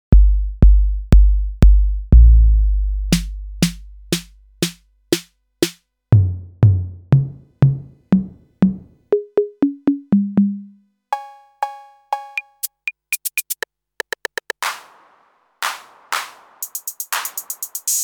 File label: 808 clone